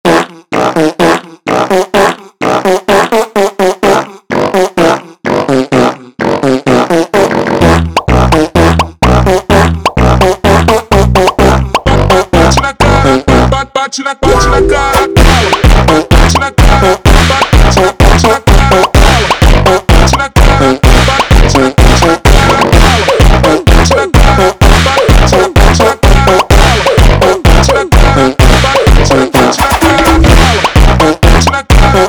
Fart Funk

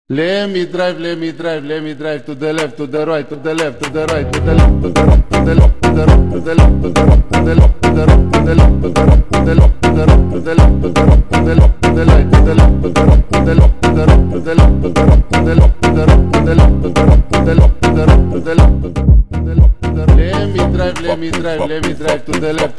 Funk slowed, funk

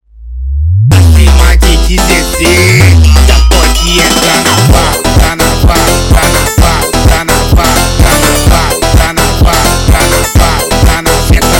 sped Up, funk

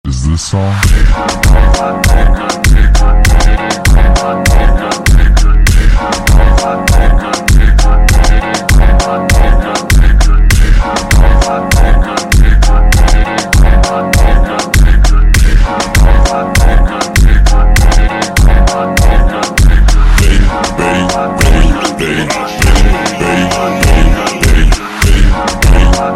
super Slowed, funk

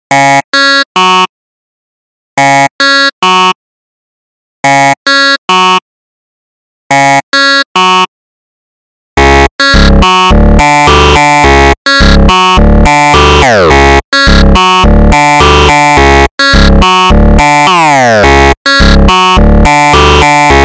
slowed, funk

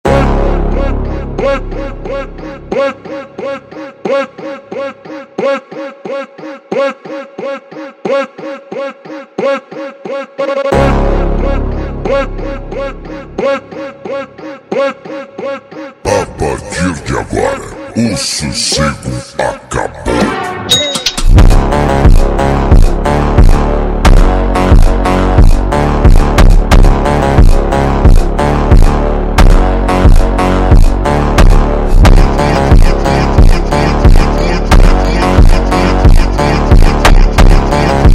super Slowed, funk

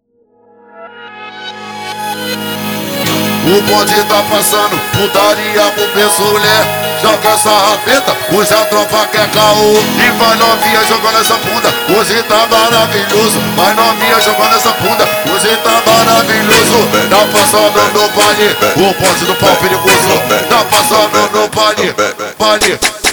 slowed, funk

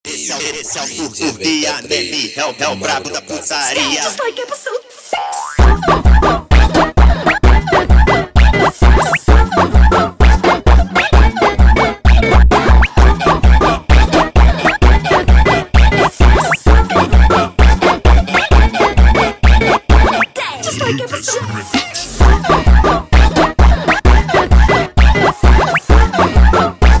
Funk

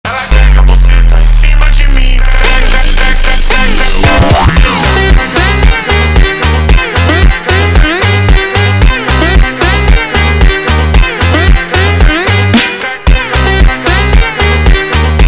slowed, funk

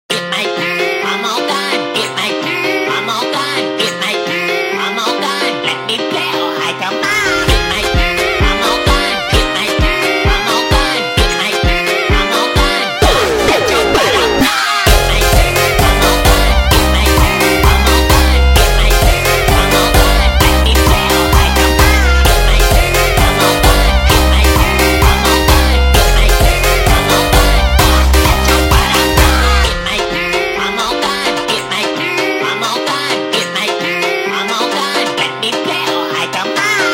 Jumpstyle, phonk music

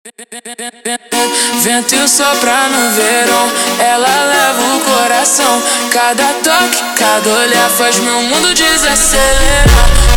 Montagem slowed, funk

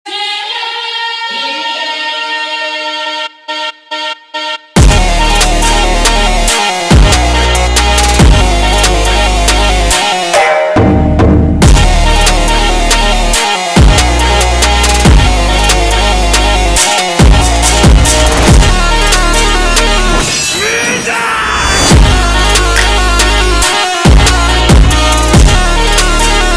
slowed, funk

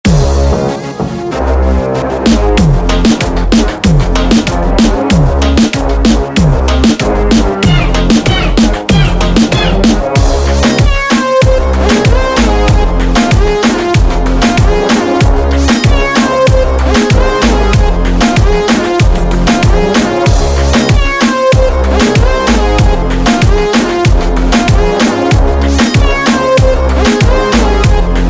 Instrumental

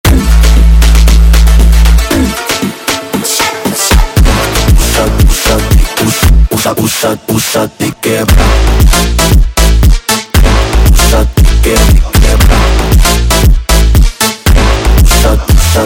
sped Up, funk

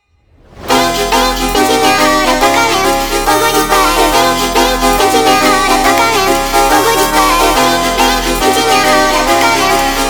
sped Up, funk

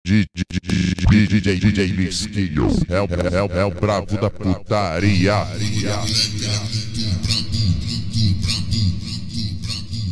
super Slowed, funk

